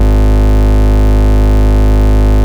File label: Root G#, Bass; Vengeance Samples